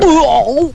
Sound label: puke guy